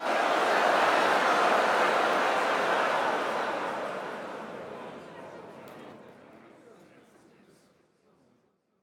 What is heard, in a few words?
Crowd Excitement Trail Off; Lunaro